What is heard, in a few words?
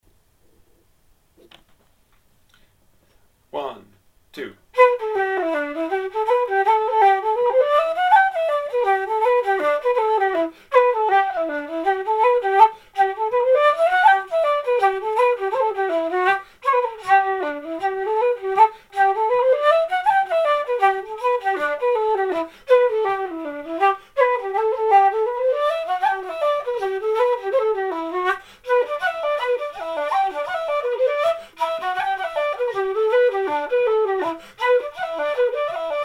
fast